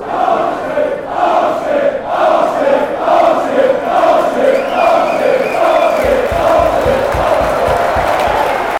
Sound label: stand up, fanchants